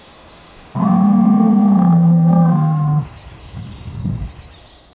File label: lion roar elephant trumpe, safari